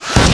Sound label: attack